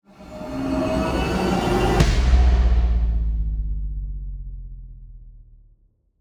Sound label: Impact